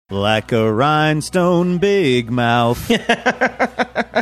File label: singing